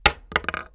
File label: wood; Mafie 1